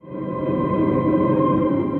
Ambient & Evolving; Ableton; Glitch and Wash

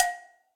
Cowbell Mid Deep Snare Dynamics; Drum Hits; Ableton; Factory Packs; Session Drums Club; Standard Hits

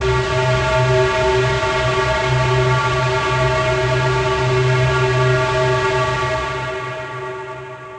Heavy Air; Instruments; Laboratory

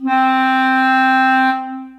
Bb Clarinet Solo Legato Vibrato; Ableton; Orchestral Woodwinds

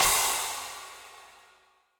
Crash FX Dark Plate Verb, Cymbal; Drums; Drum Hits; Standard Hits; Ableton; Factory Packs; Session Drums Club

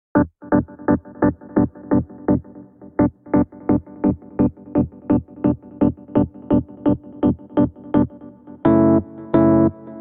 Muddy Whirl Piano E Minor 85 bpm; MIDI Clips; Tonal; Accompaniment; Ableton; Factory Packs; Chop and Swing

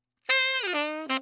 120 Trad Jazz Sax Intro C

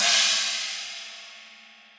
China Old School, Cymbal; Drums; Drum Hits; Standard Hits; Session Drums Studio

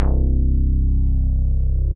Warm Ladder Bass; Ableton; Factory Packs; Chop and Swing

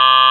android, Digital Synths

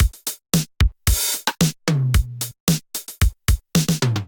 707 Kit groove 112 bpm; MIDI Clips; 111 - 130 bpm; 707 Rok With-U Kit groove; Ableton; Factory Packs; Drum Essentials

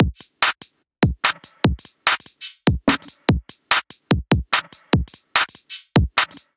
The Fear Beat 146 bpm; MIDI Clips; Ableton; Skitter and Step

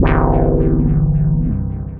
Nymph Bass; Ableton; Factory Packs; Punch and Tilt

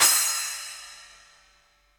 Splash 8 Ambient Loud Punch, Cymbal; Drum Hits; Standard Hits; Ableton; Factory Packs; Session Drums Studio

